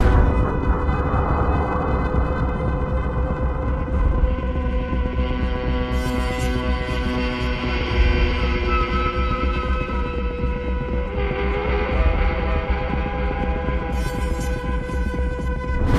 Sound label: AMB LP; transformers war for cybertron gamerip; A2 PRISON BREAK